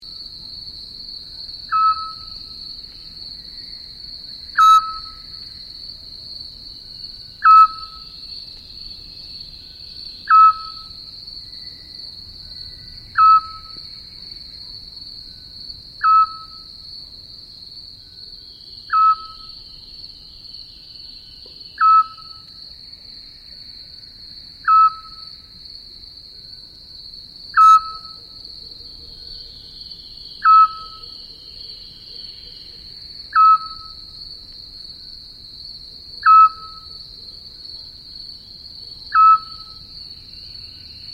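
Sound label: splyushka voice, scops owl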